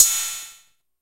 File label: RIDE, Hip Hop; E-MU Formula 4000 Series Vol. 1; Hip Hop Nation